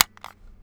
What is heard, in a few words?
on off switch; HDSnekTechDiscountPartyPack